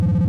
boost step

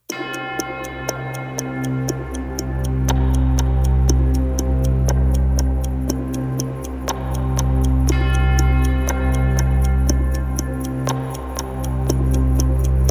ranked matchmaking music

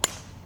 hit, Golf

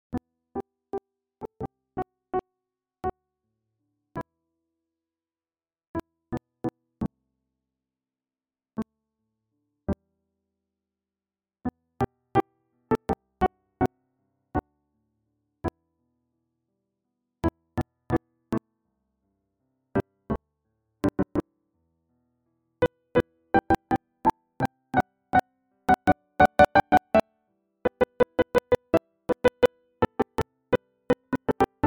piano; musics

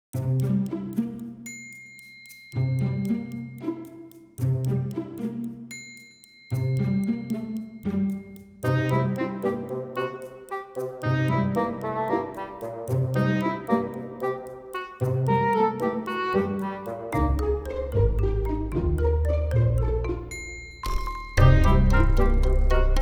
Cute Robot Theme Full Mix

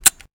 revolver empty; Stereo; Weapons; Real Guns Sound Pack 2

